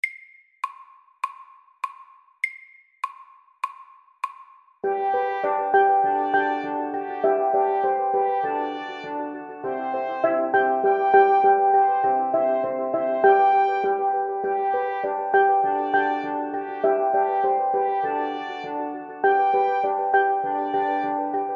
steel drum band; SAKTE

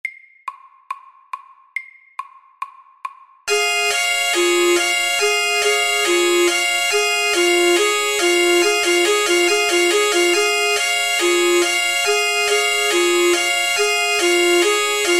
Alarm FAST 140

